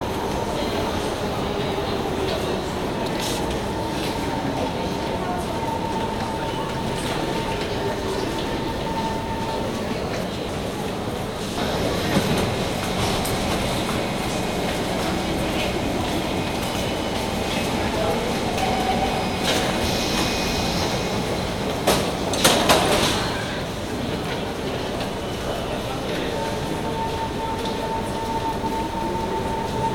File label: subwaystation; best service Blue Box